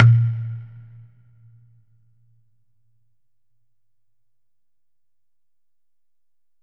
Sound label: COCONUT WET; Q Up Arts; Denny Jaeger Private Collection